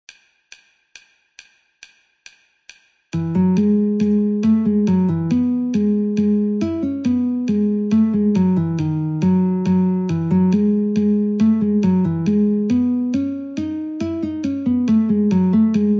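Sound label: E Guitar metronome 69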